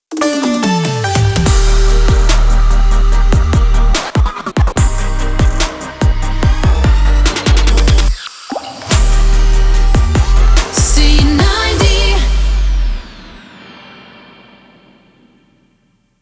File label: Jingle 145bpm c Ramp